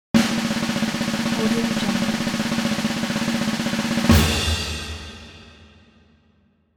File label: drum roll